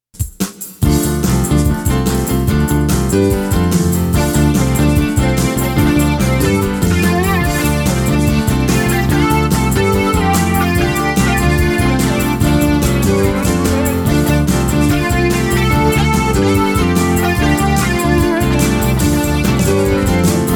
inst

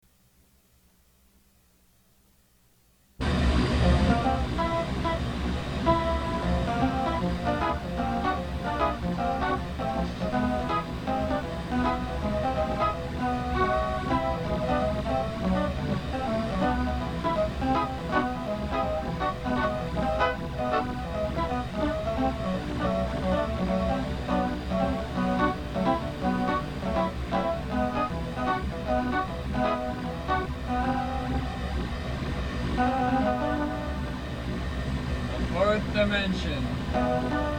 chorus

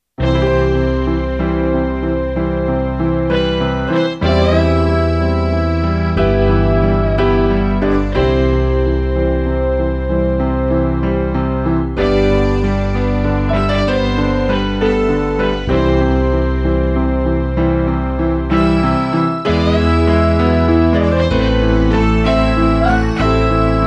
inst

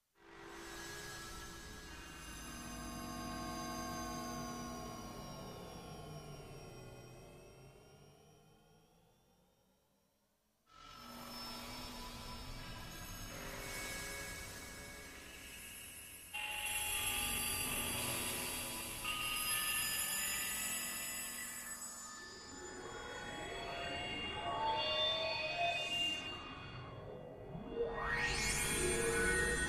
burning bells; juno g; horrodyssey

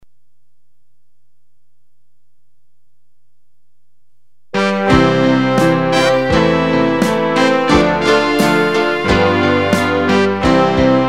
DGX with Drums

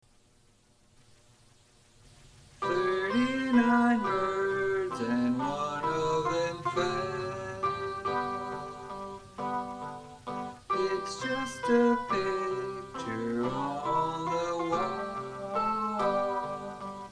birds cut chorus

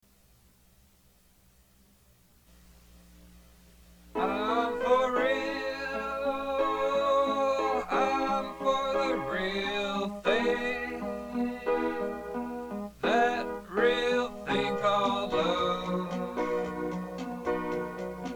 chorus

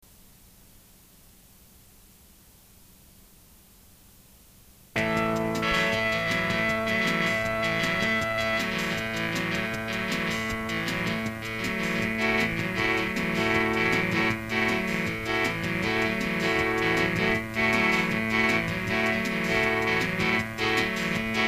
train song

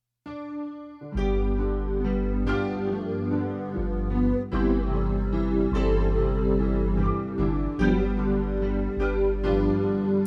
square lead chorus

